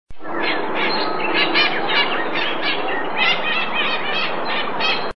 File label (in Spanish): voz calancate, calancate ala roja aratinga leucophtalm; Aratinga leucophtalma